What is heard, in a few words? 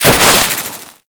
Heat Gun Hit; Warframe; Grineer